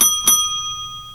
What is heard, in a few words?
ding ding; tfc